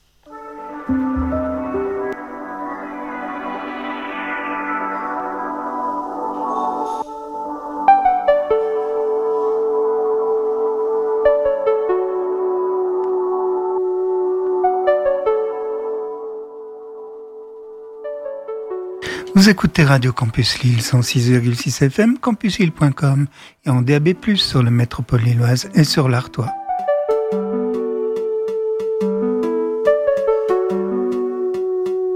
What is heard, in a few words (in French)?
Jingle Radio; Campus 2026